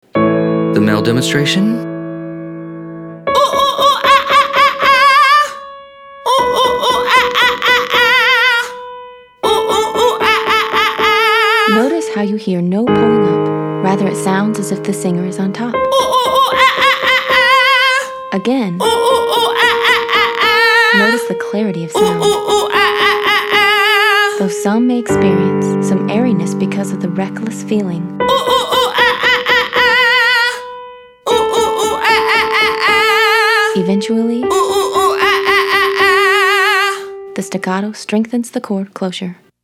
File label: Exercise 5 Monkey Male